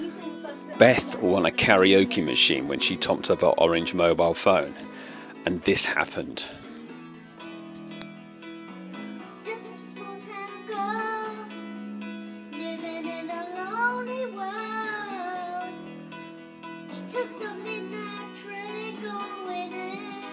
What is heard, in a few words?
karaoke singing